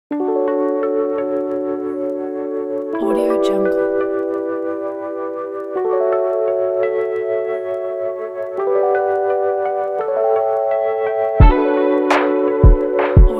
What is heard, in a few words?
the chill lofi background